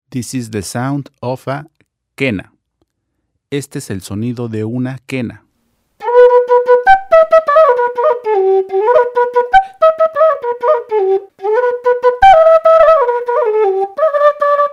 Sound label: Ancient America Peruvian Music KUENA FLUTE